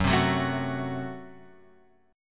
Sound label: Gb Diminished, down, Guitar, Open F; strummed; JGuitar